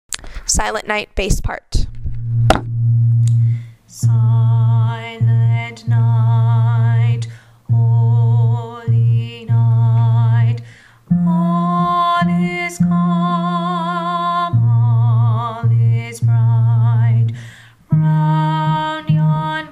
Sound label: silent night bass